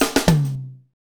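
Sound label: hip hop, Roland drum beats; Northstar - Drumscapes Roland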